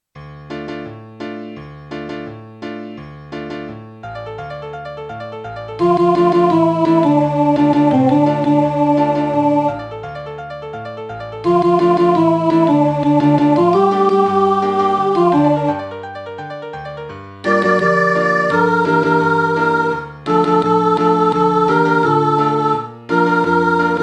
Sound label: rain tenor